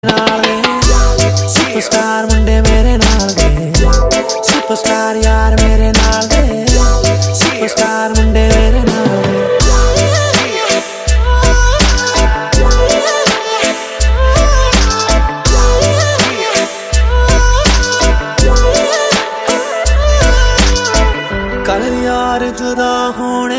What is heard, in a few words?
ringtone, bollywood ringtones